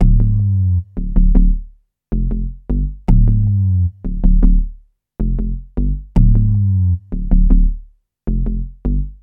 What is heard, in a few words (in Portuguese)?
ritmo